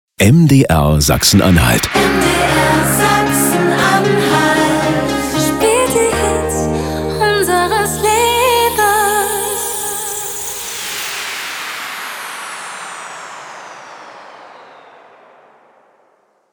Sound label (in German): Transition Beispiel easy to easy